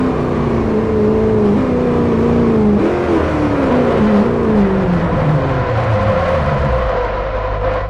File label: throttle off, vehicles bugatti